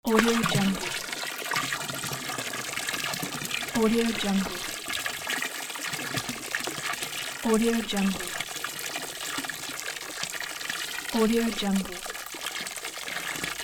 pouring the water into the barrel

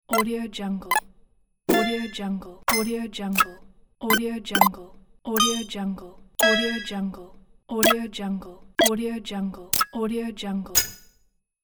app buttons

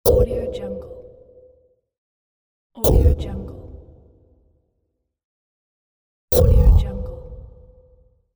teleportation

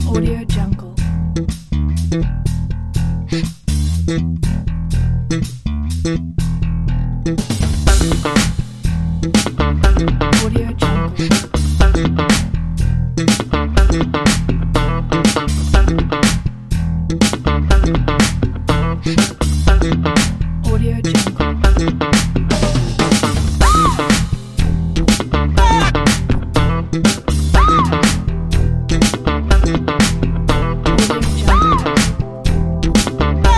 funky music

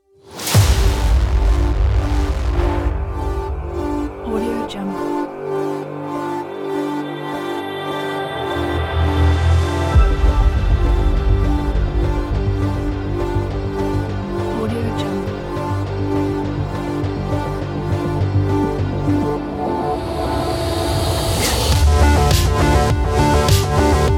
suspenseful trailer cyberpunk

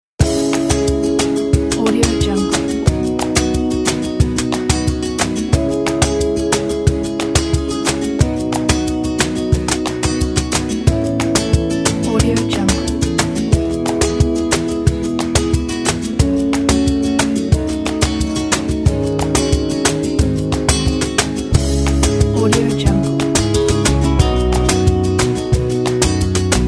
emotional flamenco

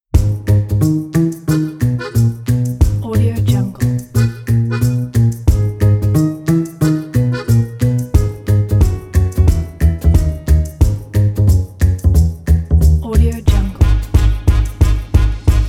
comedy detective

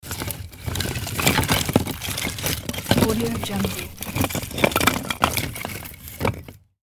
hand wood sticks sorting

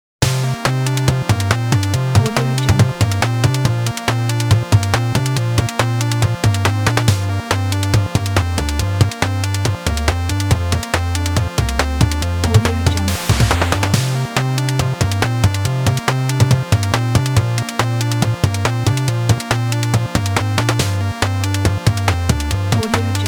8 bit

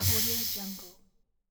scifi energy doors open

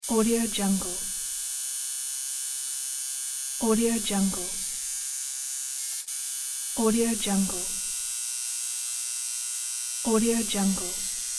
tattoo gun tattooing

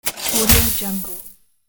short cash register with coins splash

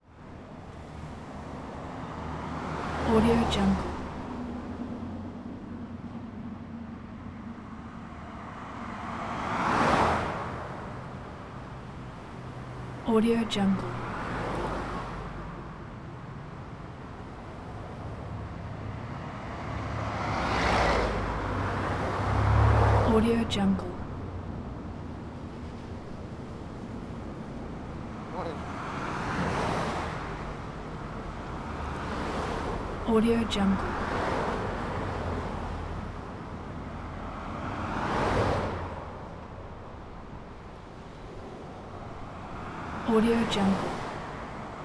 traffic car bys

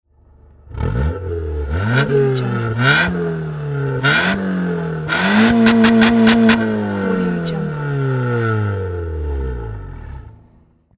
car engine

